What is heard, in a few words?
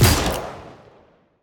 shoot